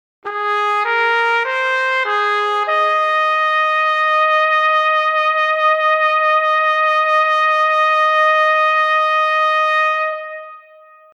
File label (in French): Vibrato amplitude